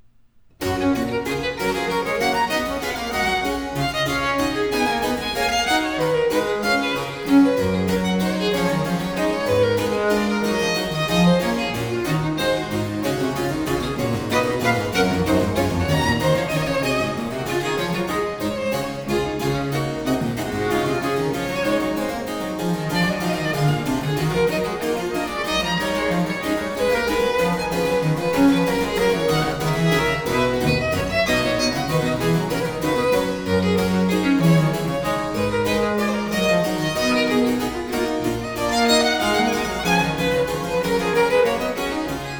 Sonata for violin & continuo in G major Vivace; BWV 1021; Bach; Sonatas for Violin & Keyboard

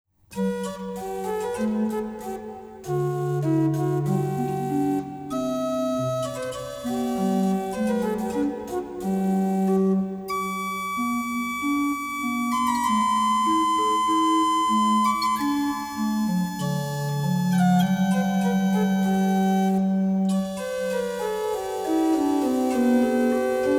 Organ

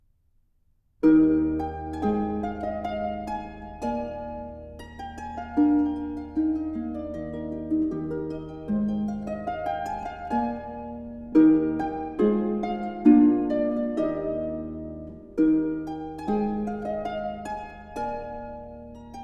French Suite, for keyboard in E flat major; BWV 815 (BC L22); Gavotte en rondeau; Bach for Babies; Fun and Games for Budding Brains

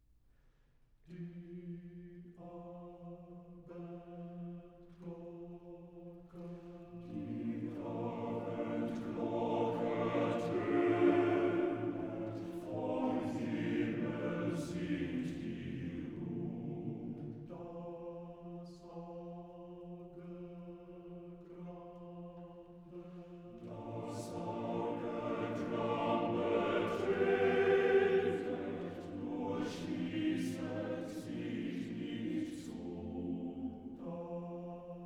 quartet for male voices; Wehmut ("Der Abendglocke"); D. 825 (Op. 64/1); Schubert; Songs for Male Chorus

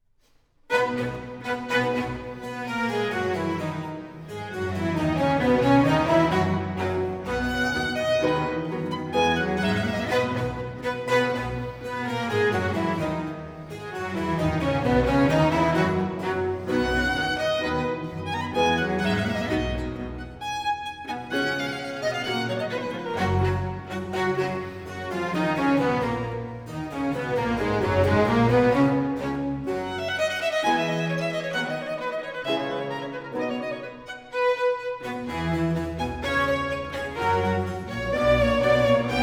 violin, strings & continuo; Andante; C major, RV 175; Vivaldi; Concerti per Violino

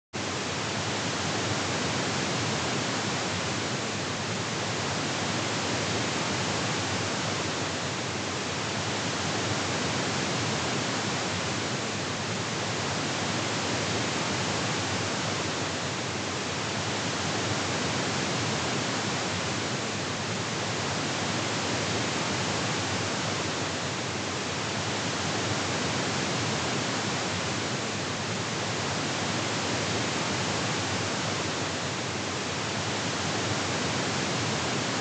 Continuous narrowband stereo pan; Stereo Setup and Imaging; Surround Spectacular

